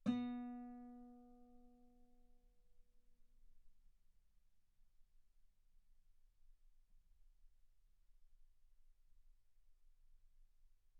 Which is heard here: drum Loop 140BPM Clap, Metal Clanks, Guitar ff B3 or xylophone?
Guitar ff B3